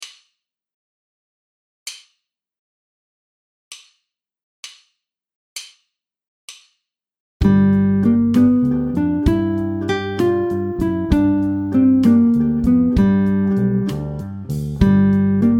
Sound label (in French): riff, guitare